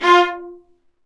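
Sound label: Marcato, Strings; Vlas Marcato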